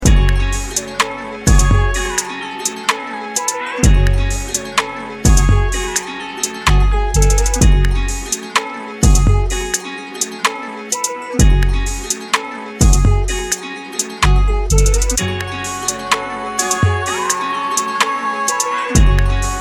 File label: instrumental ringtone